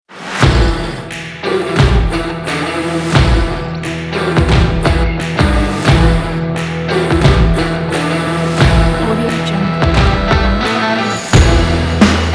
cinematic rock

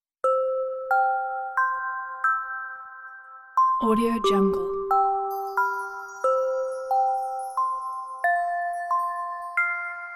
lullaby beautiful and gentle

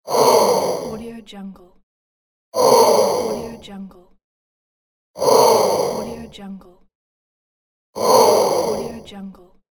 retro crowd game over

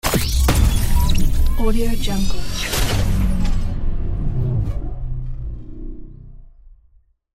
transformation glitch fx

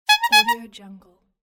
circus clown horn

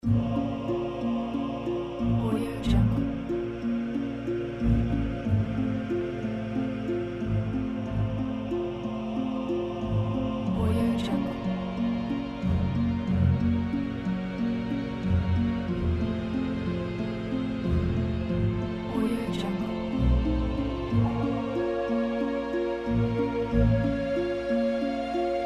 mysterious forest

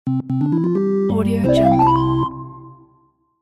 win game level

